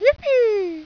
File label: YIPEE